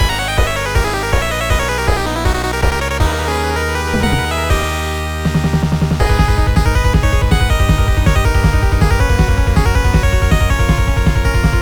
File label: 8-Bit; Bloodstained: Ritual of the Night